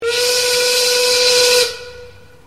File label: work whistle